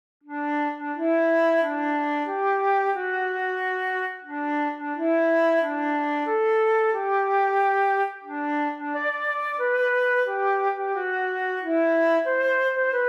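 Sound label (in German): Querflöte solo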